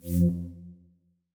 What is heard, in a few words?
side menu fly out; steam; deck